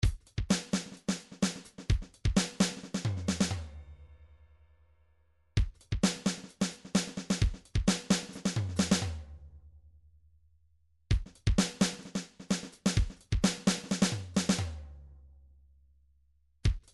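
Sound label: drums pattern, midi; Paul Kodish Dangerous Drums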